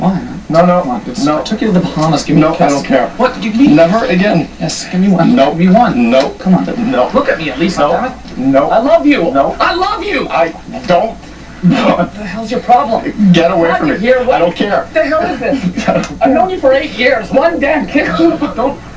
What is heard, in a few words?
kiss